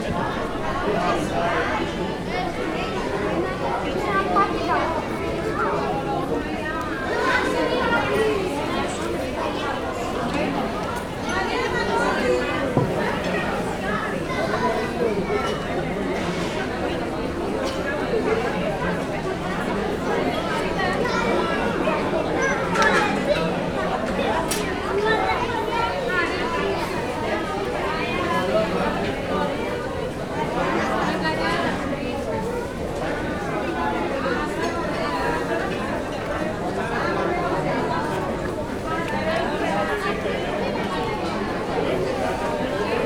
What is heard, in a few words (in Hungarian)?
pizzázó